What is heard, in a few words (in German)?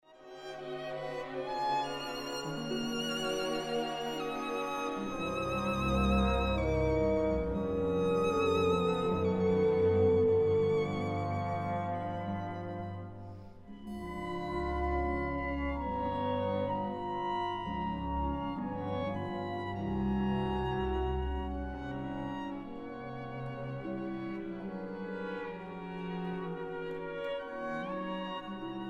Violinenkonzert; Berg